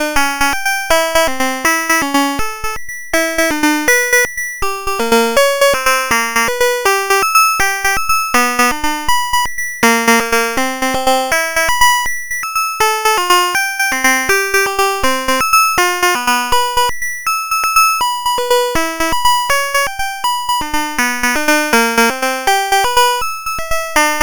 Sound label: timbre tones